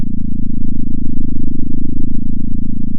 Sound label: pop, sound of a small motorcycle